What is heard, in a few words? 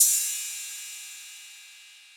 Cymbal HH Sizzle; One Shots; Drums; Ableton Live 12 Lite; Core Library